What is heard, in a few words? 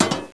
metal plugin